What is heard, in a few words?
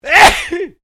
MALE SNEEZE LOUD; Male Vocal sounds; Sneezing (Sound Effects:Human)